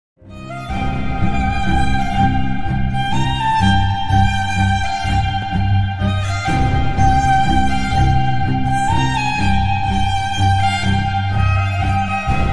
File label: villains music; bachelor full movie; raja rani bgm